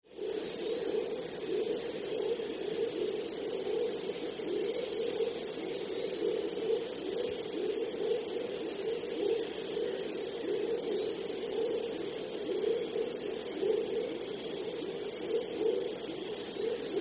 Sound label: Jungle Atmosphere; Amazon Rain Forest Atmos